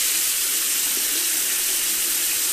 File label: faucet; minetest; homedecor modpack